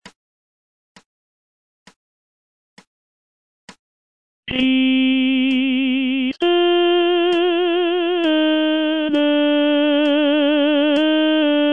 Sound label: Kirie Christe Tenor; Rossini